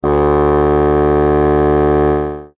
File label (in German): fagott